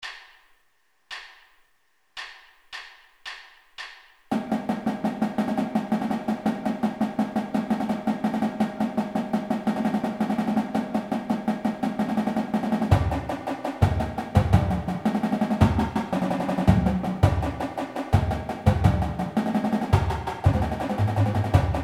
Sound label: trippy slow, marching drum music warm ups